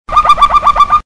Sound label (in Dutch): bollende waterslager malinois